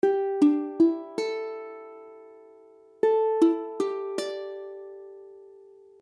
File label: A7sus4, ukulele; ukulele akordy